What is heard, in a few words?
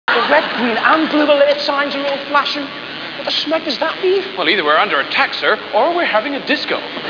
disco